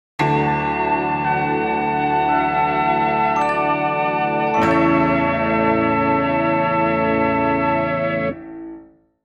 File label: Cadence Sting